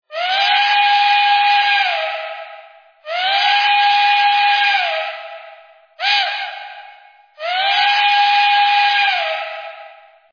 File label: Whistle; MTH; Docsider